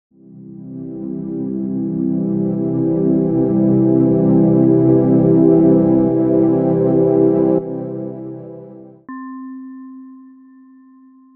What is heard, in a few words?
chime; kriya